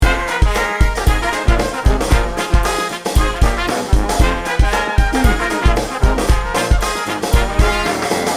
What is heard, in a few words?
mardi gras party loop